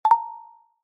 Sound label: notification alert prompt bright ping pluck generic; zapsplat